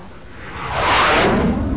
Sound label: FIRE